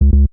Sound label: error